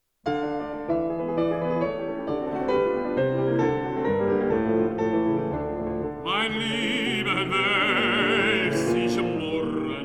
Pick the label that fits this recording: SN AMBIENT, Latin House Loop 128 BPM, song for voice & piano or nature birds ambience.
song for voice & piano